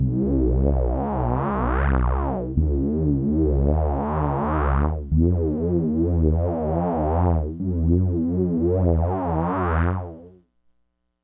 Mangling Patterns

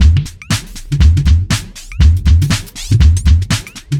2bar 120

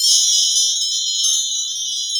Animated Chimes

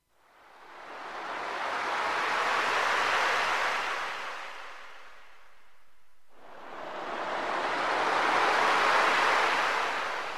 Seashore relaxation

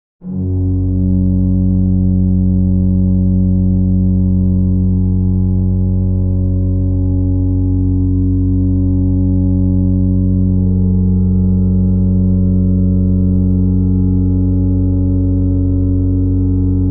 organ

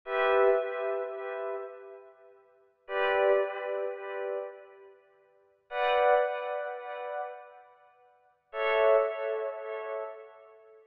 85bpm Drum and Synth

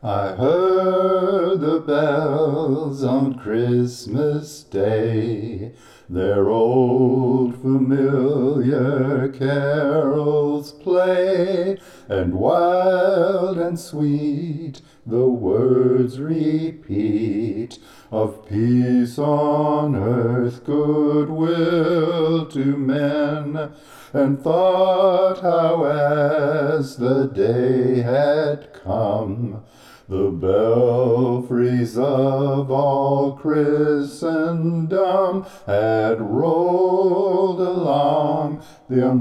Christmas Bells

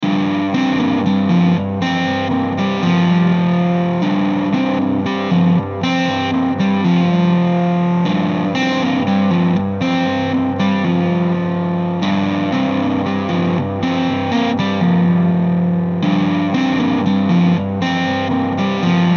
G120 Riff

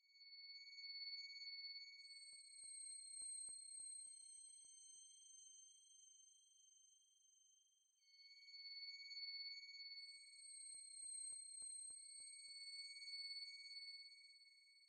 Spacey dream 60bpm Atmosphere Hi

